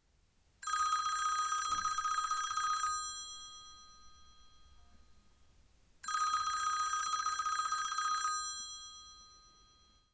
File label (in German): Telefonklingeln